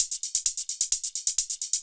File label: drum 130 hihat, Techno Trance, Drumloops